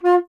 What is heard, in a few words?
Alto Flute